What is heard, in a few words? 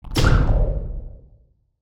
sci fi blast; soundscrate